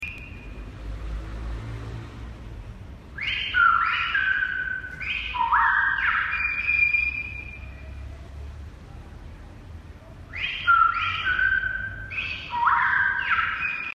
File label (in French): son oiseau